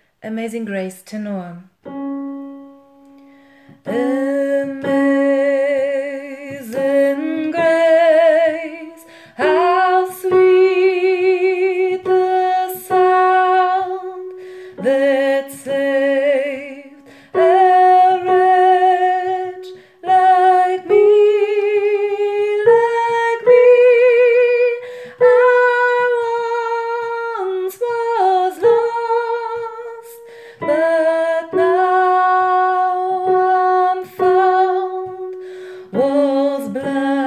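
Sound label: Amazing grace tenor, gospel choir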